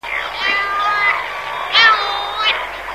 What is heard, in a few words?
Calonectris diomedea song; birdwatching